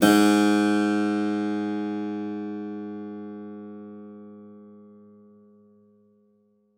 SPIN G2, spinet; Xsample VOL-7

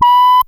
COMPUTA TONE; Zero-G Ambient 1; COMPUTACHATA

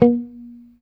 C5 sus, Strat guitar; Spectrasonics; Hans Zimmer Guitars VOL-1; JC-STRAT MTE

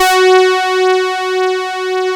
BASIC OB F#4, OB Synths, Analog; E-MU Formula 4000 Series Vol. 3; Analog Odyssey

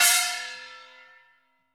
CHINESE GONG; AKAI S-Series CD-ROM Sound Library VOL-5

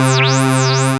syn c, Dance Synths, Acid Bubble

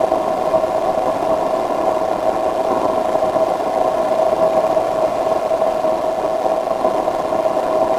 Drone Ambient; Drums & Drones

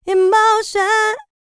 licks, vocal, dance; ILIO; Vocal Planet

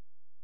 C#, Synclav FM, FX; Big Fish Audio

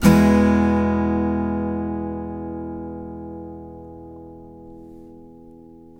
MAJ E, CHDMAJ, Blues Guitar Samples; ILIO; Fretworks

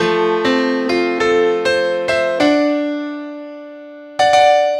Piano; Sonic Foundry (Sony Creative Software) - Rondo Brothers Trip-Hop Loops & Samples; El Corazon

